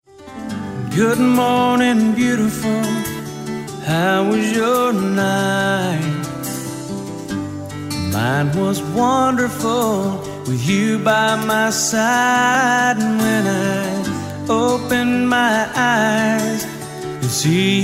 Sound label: good morning ringtone